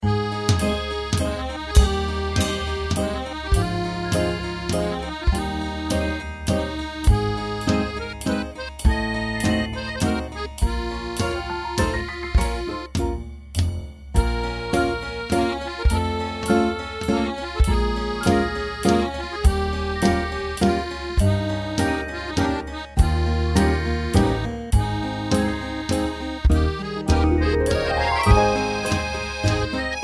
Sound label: drumming garage band waltz